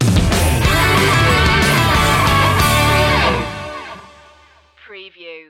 deck the halls green remix sting

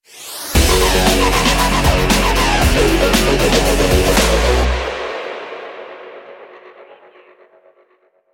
boots of fire stinger

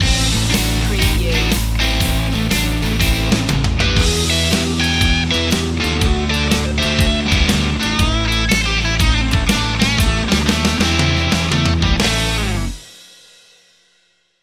blues

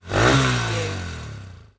Motorcycle Dirt Bike Rev

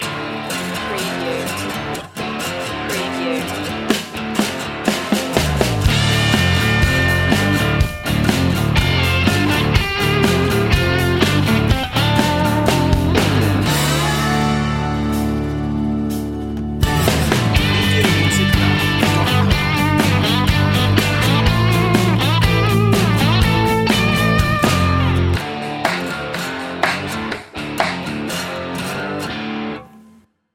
country rock

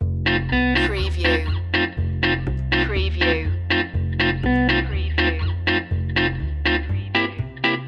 reggae reverie loop

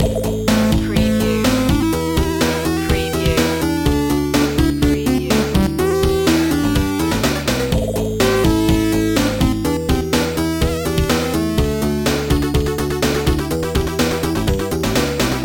retro video game hotwired loop

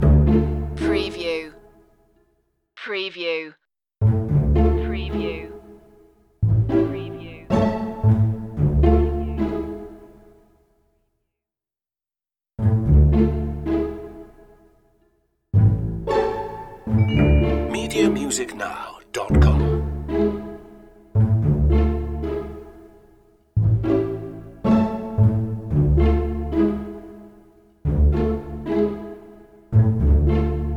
pondering loop

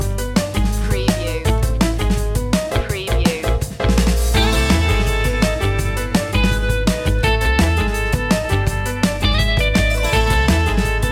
spanish tango music

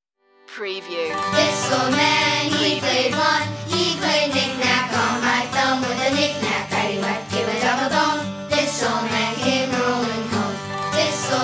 this old man children group vocal

